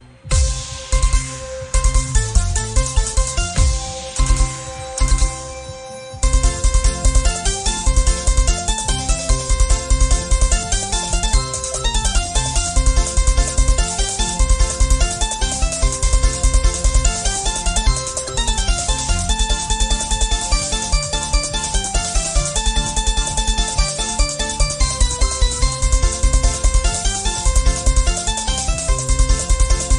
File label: Big Win